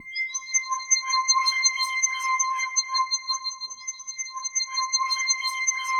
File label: C6, SynthLine; 90 s Sample CDs; NiceBeats; SynthLine VOL-5